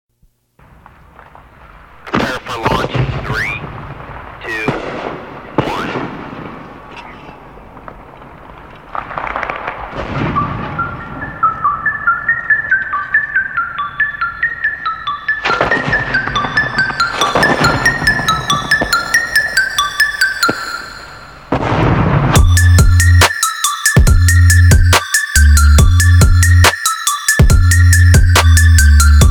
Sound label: Trap